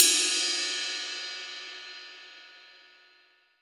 RIDE, MONO RIDE

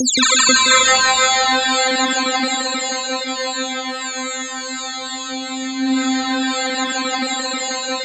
decay; 90 s Sample CDs; NiceBeats; SynthLine VOL-2

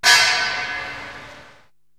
SD Amb Anvil Hit, Korg TR-Rack drum machine

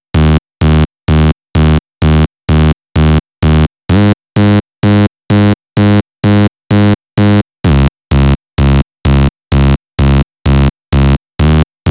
dirty bass; Session 09 - Retro Fit

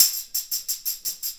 Tambourine; Sonic Foundry (Sony Creative Software) - Essential Percussion

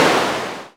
PRC CLAP, Analog Drums; Roland L-CD701

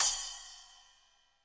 splash, splashes

